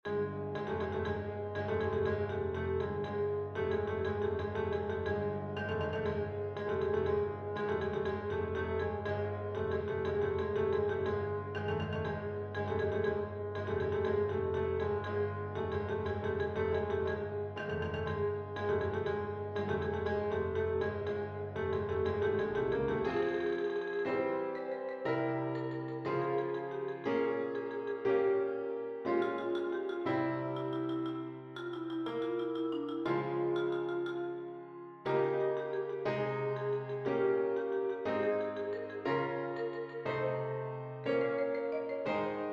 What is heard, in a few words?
marche funebre; Tableau IV; Marcha funebre